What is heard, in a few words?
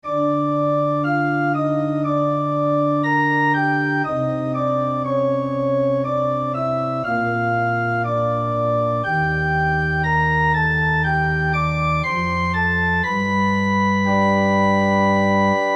organ; rpg; weeklybeats-2016